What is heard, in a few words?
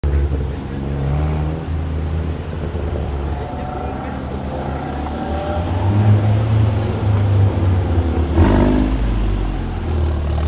Golf Exhaust